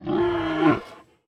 熊猫攻击型声

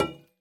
铜灯 放置声

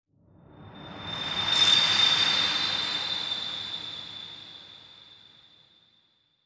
下界荒地氛围声; ambient

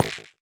下界木悬挂告示牌踩踏声

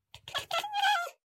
猫乞食声

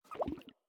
美西螈待机声

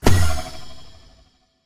幻术师镜像移动声

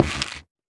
菌柄踩踏声

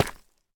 深板岩 踩踏声

